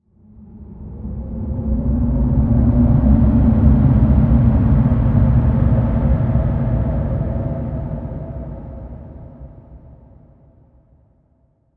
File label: Rnd Abstract Amb